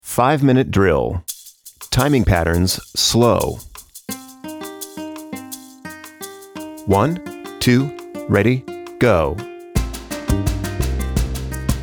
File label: pad timing patterns slow